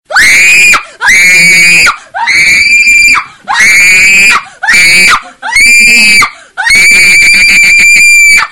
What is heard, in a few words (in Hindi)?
cheekh; jazz